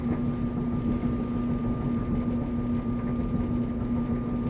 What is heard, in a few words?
Chemical Crystalizer; Mekanism tilera Edition